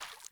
Fawn Footstep Water, Foley; Animals; Ambient

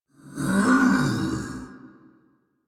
Attack Effort; Warframe; Dax Heavy; en

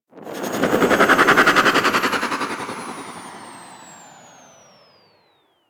Archwing Movement Slow Wind Down; Warframe

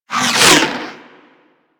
Grenade Launch Whoosh; Warframe; Grineer Ghouls; Ghoul Expired; Attacks

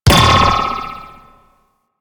Ball Bounce Goal Post; Warframe; Missions; Tenno Ball

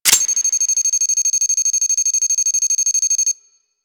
Fortress Mine Timer; Ambience; Warframe; Grineer Fortress

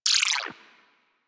Sentient Trooper Link Beam End; Warframe; DE; Sentients

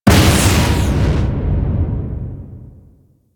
Asteroid Thruster Explosion; Warframe; Railjack

